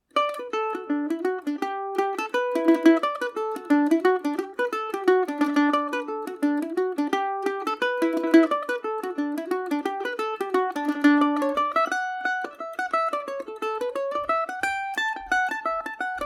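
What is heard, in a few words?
D major, Irish mandolin; limerick lasses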